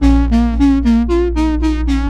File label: Loop